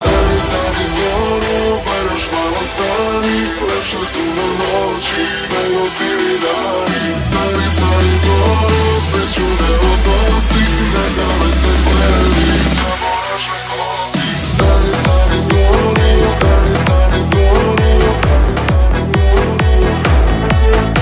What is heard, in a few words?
beat